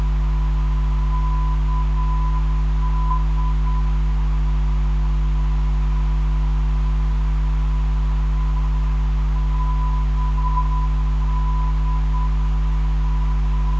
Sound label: 298.92kHz, NDB